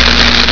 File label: fry